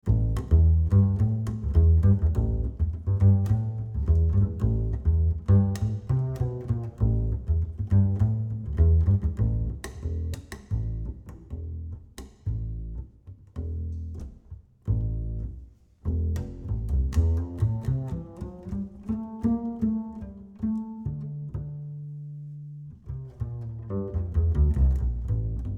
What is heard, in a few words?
Ac Bass 50cm C Plucked Low Mid